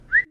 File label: Bird